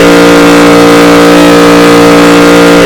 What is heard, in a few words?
dodge; onhigh